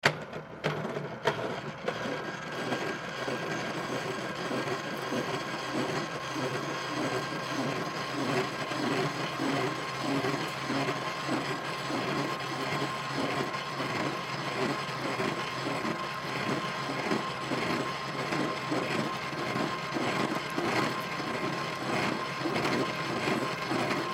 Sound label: Drill Press Drill Hole Into Steel; Drill Press Drill Hole Into Steel Sheet; Drills; Blastwave